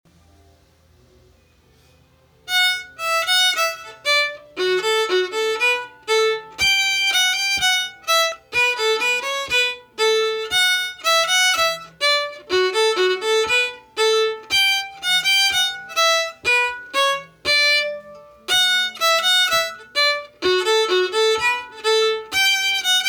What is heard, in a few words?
Gaspé Reel